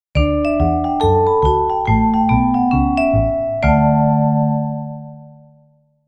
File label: jazz, Radio Jingle; radio spots